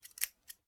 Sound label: office scissors cut air single; sound effects one